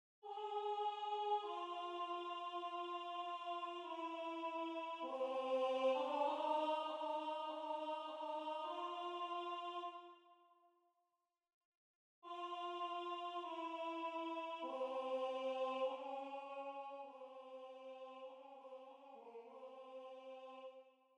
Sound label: Alto; Gevaert